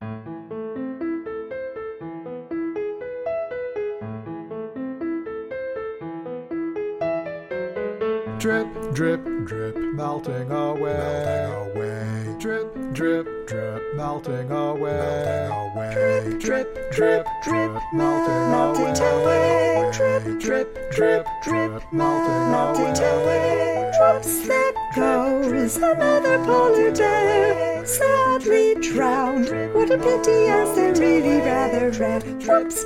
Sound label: drip drip all voices